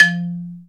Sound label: marimba